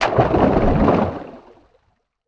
Underwater Water Object Movement Splash Gurgle Bubbles